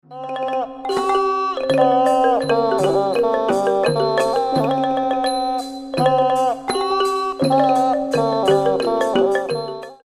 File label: idiophone